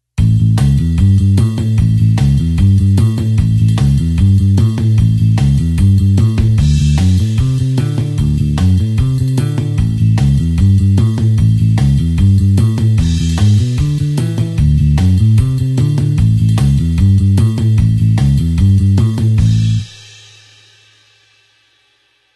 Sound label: blues bassline 8beat A tempo